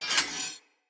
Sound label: Split Sword Equip; Weapons; Player; Swords; Starter